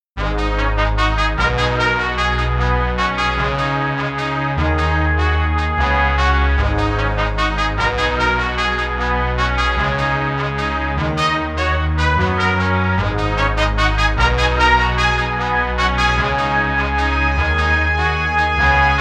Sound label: Brass Melody 150 BPM